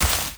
land grass; gamerip

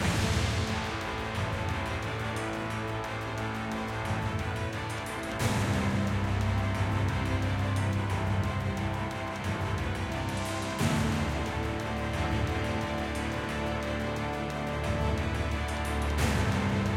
Destruction High; Great Wall

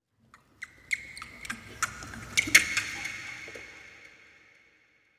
creature cackle; environment; Aragami

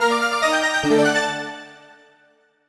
Jingle Dungeon Unlock; Puzzle & Dragons Gold

